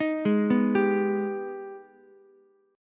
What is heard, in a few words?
G sharp Minor Major 7th G sharp down Ukulele; arpeggiated; JGuitar